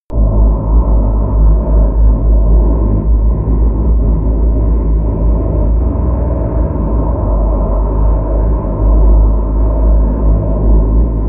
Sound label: drone stealth low